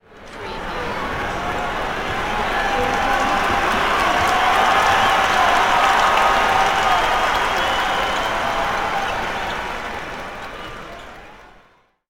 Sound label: Basketball Pro Crowd Cheering